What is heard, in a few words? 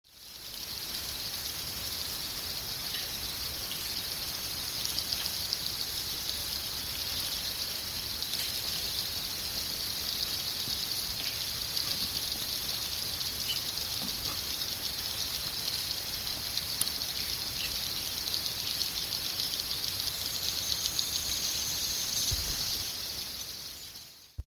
Cave Sounds; Langun-Gobingob